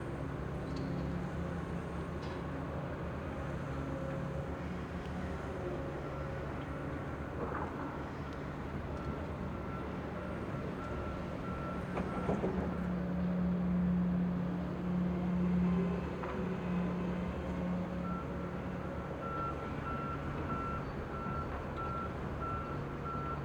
Amb. Construction, Ext, Engines, Impacts, Clanging, Diffuse; Hzandbits; Construction Ambiances